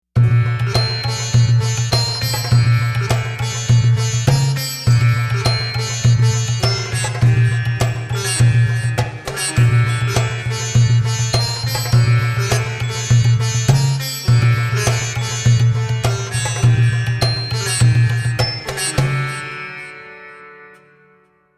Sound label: Sitar